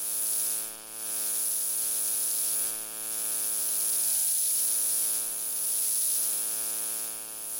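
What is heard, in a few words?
ambient hum buzz loop, electric; Half Life Model Pack; Half Life Alyx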